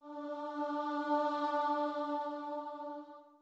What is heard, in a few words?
starting note, voice; andante with a crescendo